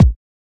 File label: Kicks